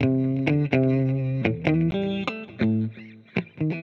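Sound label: electric guitar, ethno, 125, A; eJay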